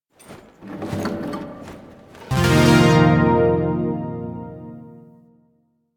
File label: Shawzin Equip; Zariman Shawzin